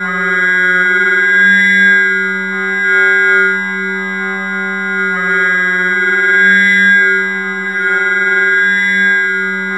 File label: SF DRONE, NASTY RM FX; Zero-G Ambient 1